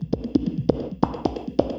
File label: Electronic Percussion